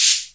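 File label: cymbal, Hammond Drum Machine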